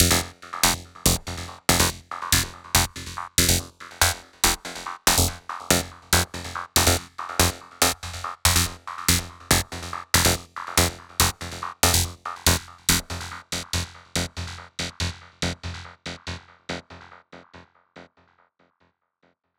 Full Meat Lead Line F 142 BPM, Psytrance; Lead Lines

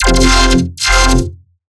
Melodic Bass F; One-Shots; Bass Hits (Melodic); Portal; Ghosthack; Color Bass Essentials

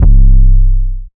808 (C); Cymatics; Orchid Sample Pack; 808s & Basses; Rum

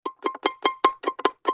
Pizzicato Loop, Vintage Cartoon; Musical Elements

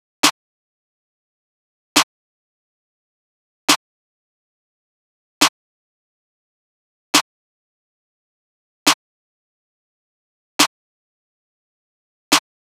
Drum Loop 139 BPM Clap, Hip Hop; Drum Loops; Loop Stems; Cymatics; Monaco

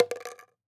Mini Drum; World Percussion; Cymatics; Emperor World Percussion

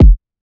Kick, Trap Drums; One Shots; GhostHack; Drum Mega Bundle; Achieve

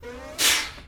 18 Wheeler Air Brakes; Vehicles; Cars & Trucks

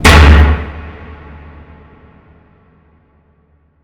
impact hatch; Hits

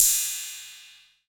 Crash, Synthesized, Crash Cymbals; One Shots; Argon; Ghosthack - Drum Hero 5

